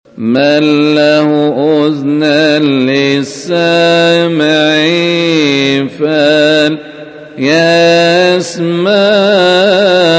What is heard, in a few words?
Passion Saturday Who Has Ears Arabic